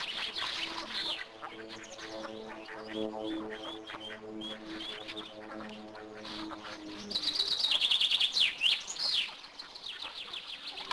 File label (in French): avion oiso